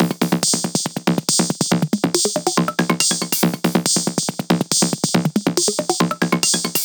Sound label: 140 bpm Perc Loop, Dubstep; Rapid